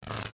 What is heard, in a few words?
spark; ambience; Star Wars Jedi Knight II Jedi Outcast; XBOX